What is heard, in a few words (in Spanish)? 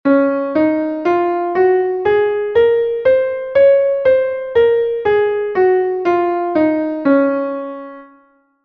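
do re bemol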